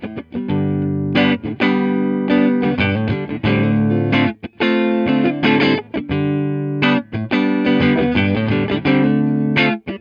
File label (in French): tele manche clair